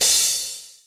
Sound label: Cymbal G